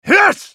spellcast effort; Talon; en US

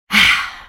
effort; Elise

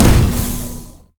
overdrive; LoL; blitzcrank iblitz

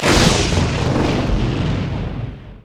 rolling thunder; LoL; volibear

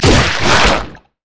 stretch strike; LoL; zac base

